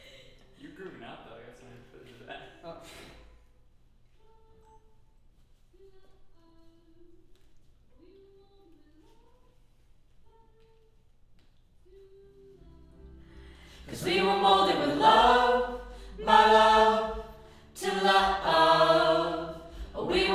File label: Choir Mid